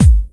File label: clear kick sound g sharp key